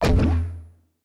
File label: out of coins gems; Animation Throwdown; cardbabies